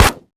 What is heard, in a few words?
cardboard hit, bullet hit; projectiles; Max Payne